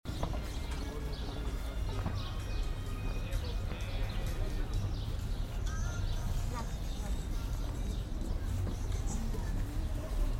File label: ambience